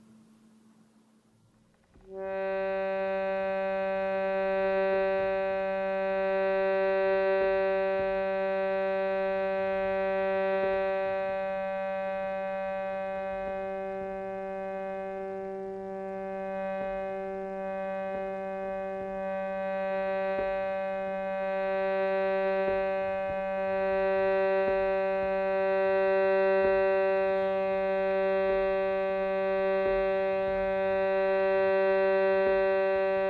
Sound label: Sound Meditation Shruti box; Alexandre Tannous-Playing The Shruti Box